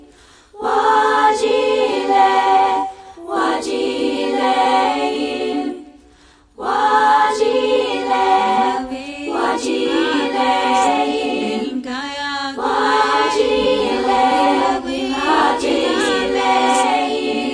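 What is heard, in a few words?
wahjeeleh rhythm fast, FEMALE SINGING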